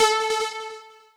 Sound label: lead syn A, synth notes; Techno Trance Essentials